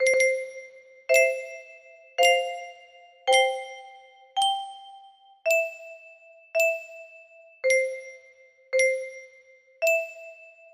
music box

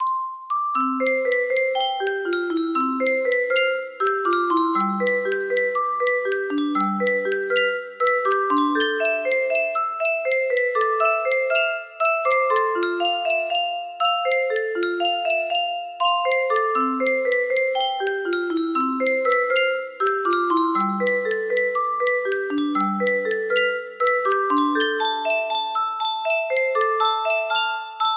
melody, music box

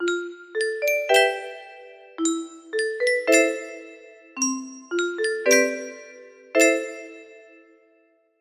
lullaby, music box melody

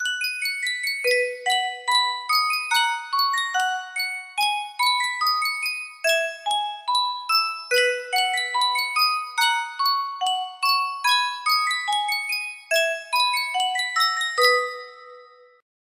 music box

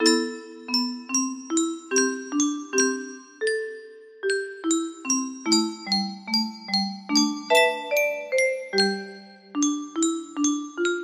melody

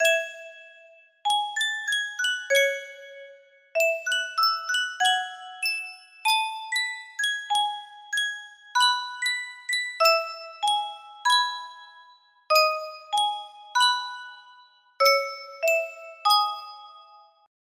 sankyo custom tune music box

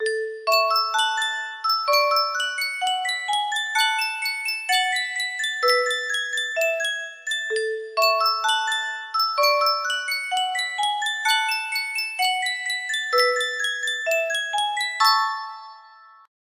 Yunsheng music box melody

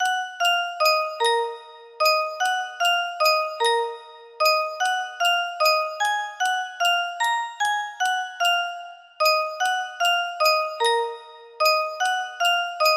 Waltz, music box melody

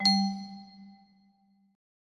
music box melody

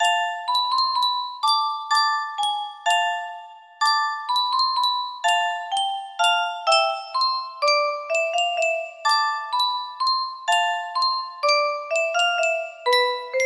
lullaby version, music box melody

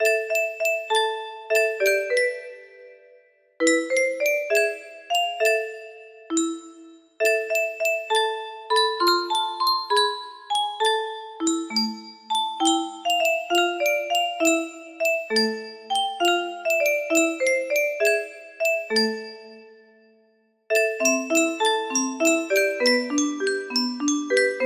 lullaby, music box

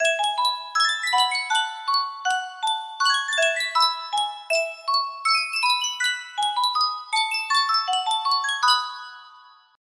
sankyo miniature music box

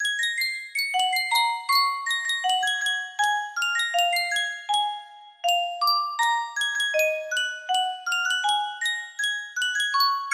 sankyo music box

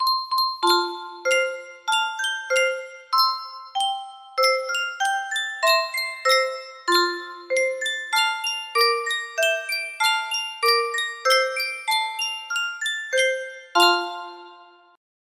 Sankyo custom tune music box

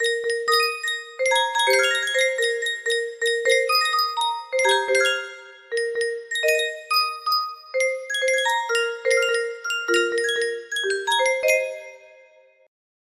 melody

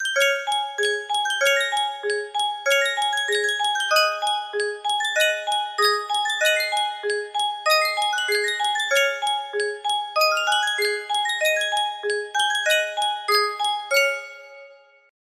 sankyo music box, polka

